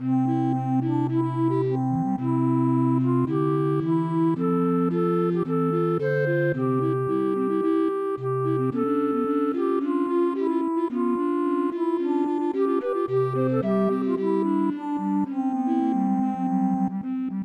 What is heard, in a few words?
Recorder Quartet; midi; traditional